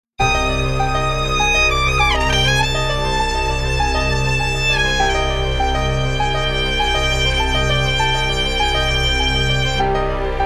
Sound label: instrumental